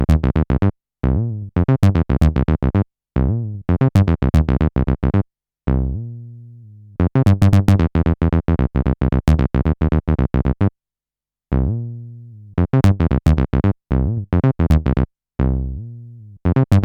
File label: Stutter